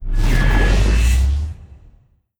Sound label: moving out fallen empire ship; Stellaris